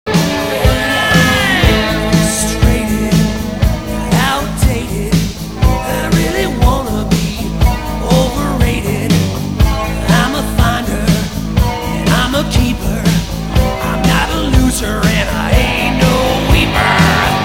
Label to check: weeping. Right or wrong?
Right